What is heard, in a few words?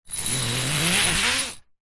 trampoline net zip open or close; sport; zapsplat